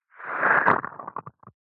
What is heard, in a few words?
human stomach rumble gurgle short